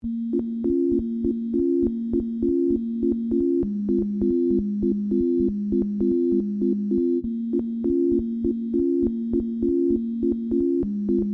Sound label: sad blues